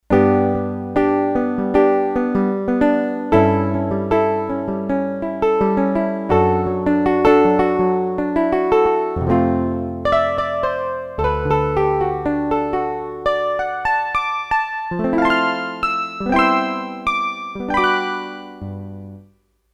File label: Synth Piano, Roland D-10; Factory Patches